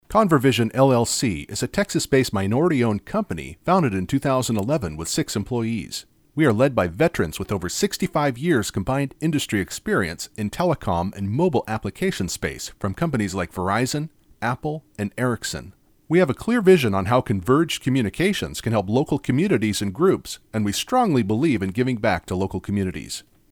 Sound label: Slide